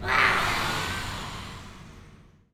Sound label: whuahrrrr